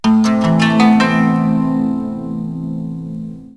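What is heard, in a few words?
KANU, Oriental; Best Service; Ethnic Journey Sound Cube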